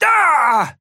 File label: pain big; Trapper